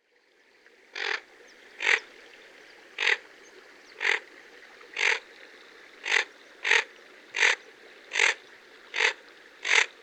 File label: Northern pintail